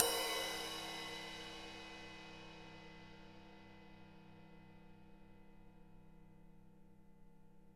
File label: Crash, EMU PX-7 Drum Machines